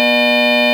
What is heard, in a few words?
f1 engine; vamos